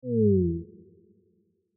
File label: ui flip